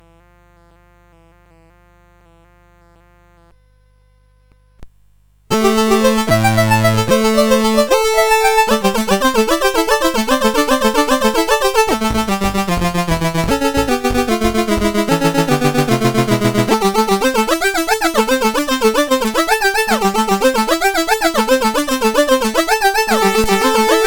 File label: Riff, CSG8580R5